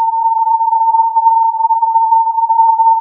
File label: filtered input